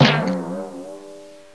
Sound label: bounce; McDonaldland - Silly Games; McDonald's